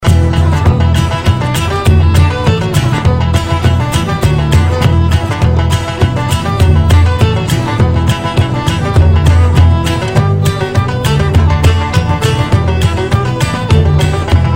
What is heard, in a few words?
irish tavern music